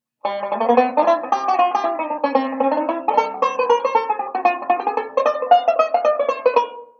Connected Roots jazzy, chord melody; Beyond Chord Melody Volume II

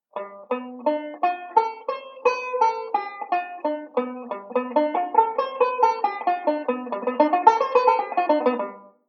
Modal Speed Drill, banjo; Beyond Chord Melody Volume II

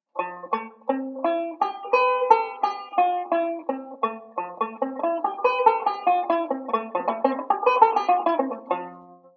Modal Speed Drill, banjo

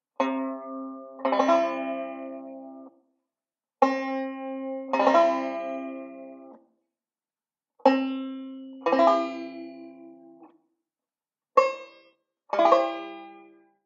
C Chords, banjo; Beyond Chord Melody Volume II

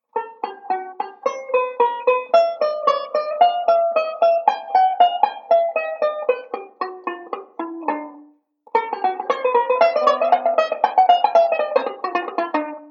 ii V Pattern, banjo

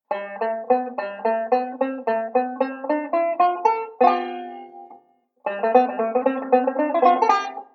ii V Pattern, banjo

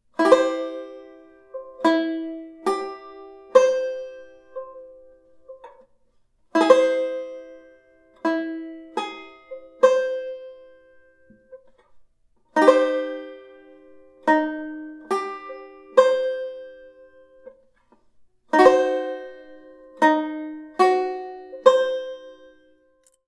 1st Triads, banjo; Beyond Chord Melody Volume II

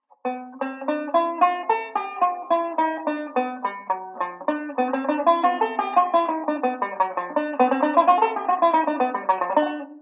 ii V Speed Drill, banjo; Beyond Chord Melody Volume II

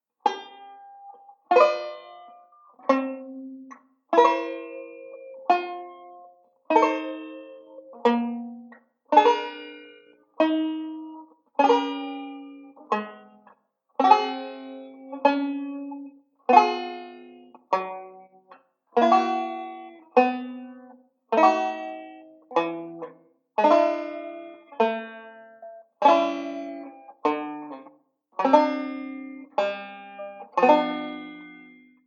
banjo; beyond chord melody volume ii

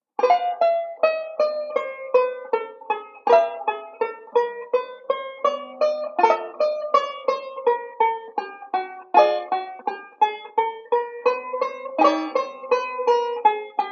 banjo; Beyond Chord Melody Volume II

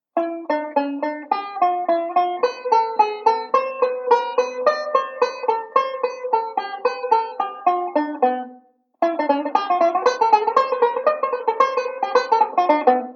ii V Pattern, banjo

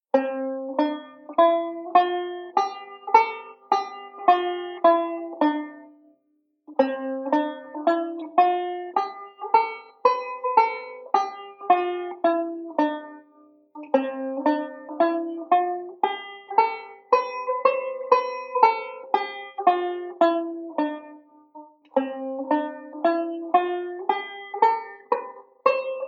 C Ionian, chord melody; Beyond Chord Melody Volume II

